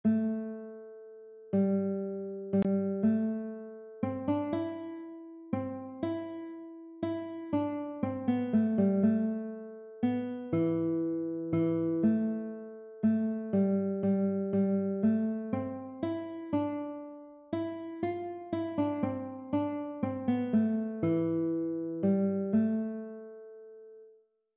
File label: a minor etude